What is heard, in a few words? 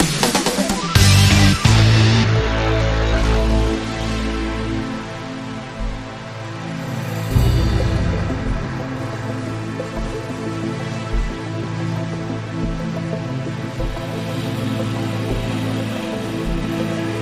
Power News Pad